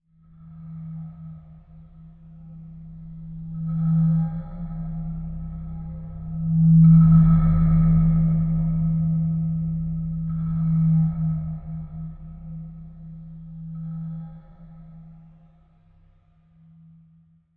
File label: Clock Chime Reverse, Production, Element, Clock, Chime, Reverb; Clocks; Sound Effects:Machines